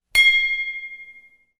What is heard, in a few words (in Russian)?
bokal